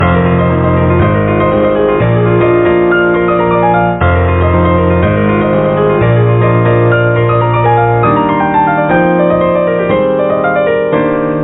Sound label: piano